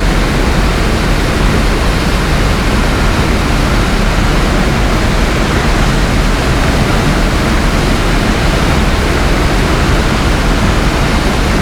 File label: Bf-109K-4 Plane Wind In L; DCS